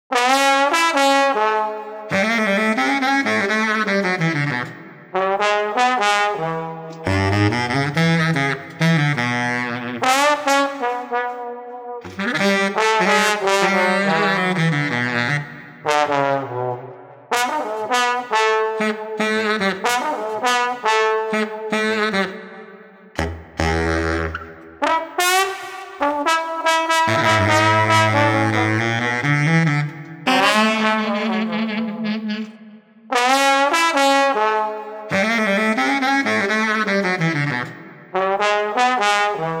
Street Baritone Sax Trombone Cool 95

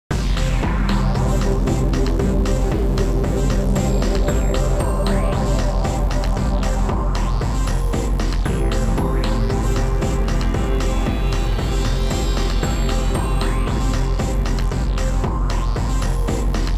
Analog

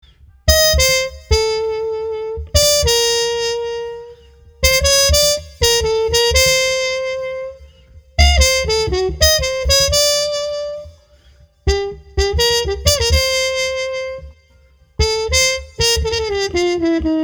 Street Trumpet Muted Roaming 110

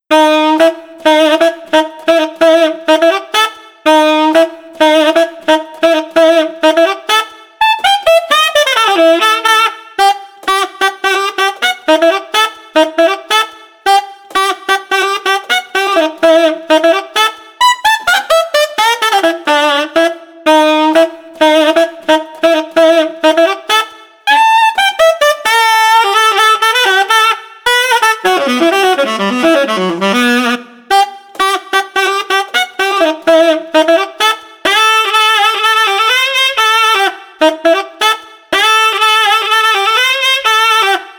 Street Alto Sax Cookin 120

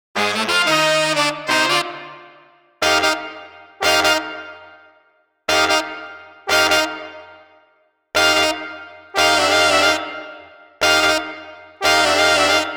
Street Brass Combo Funky no Drums 90

